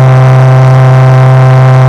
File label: wankel small, engines; acf engines